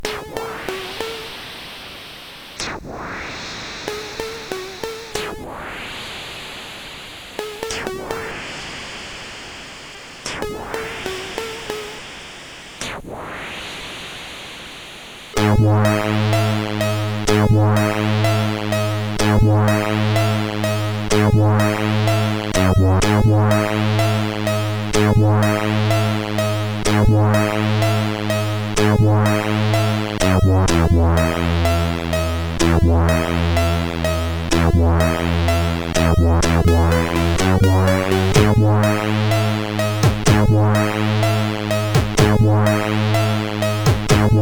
Intro, sid CSG8580R5; hvsc

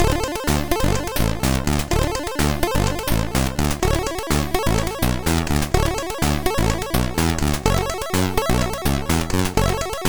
Jazzy Tune, MOS6581R3

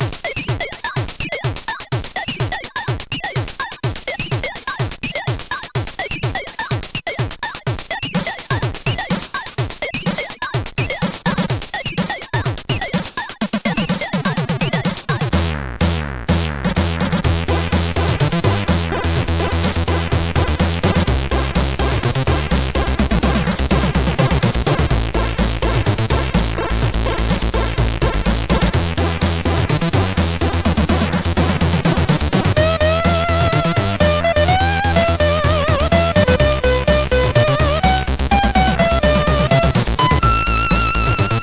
Intro, sid CSG8580R5; RWE; hvsc

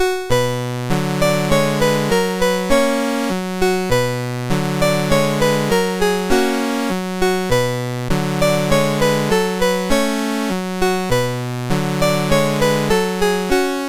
Waltz, MOS6581R3; David W Tattam

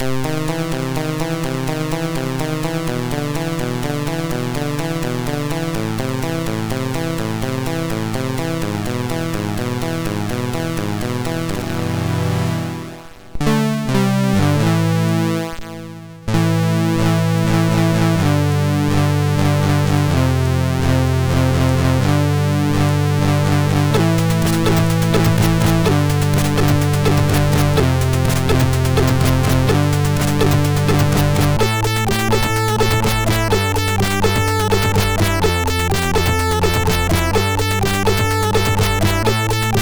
sid MOS6581R2; Chonixia

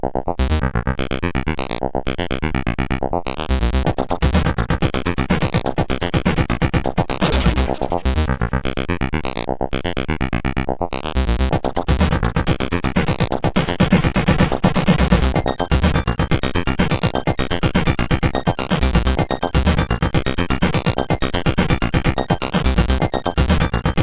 Music 2, CSG8580

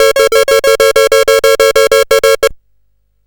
Synth Sample, CSG8580R5

